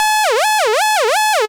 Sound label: Beat